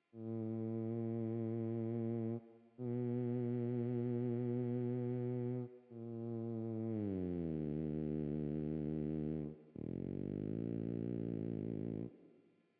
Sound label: Theremin; Hylics